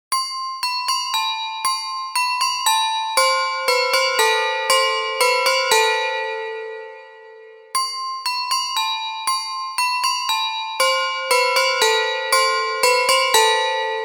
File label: nice bell ringtone